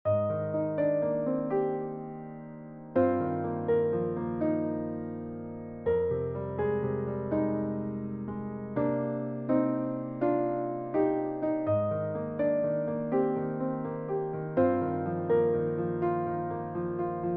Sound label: piano